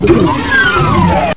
door move; svencoop; classic

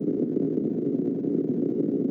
robo idle

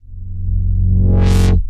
med shot, weapons; svencoop; legends

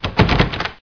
latch unlocked; mistake coop

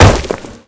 damage stone; svencoop; gruntsparty; rockstage

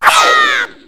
spin, weapons; zelda

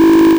draw; svencoop; w00tguy; arcade; tron

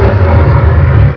alert; svencoop; legends

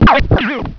step; svencoop; cracklife; gonarch

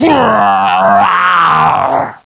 die; svencoop; cracklife; garg

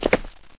pl jump; mistake coop; player

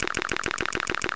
baba bite, enemies; zelda

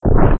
baba grow, enemies; zelda

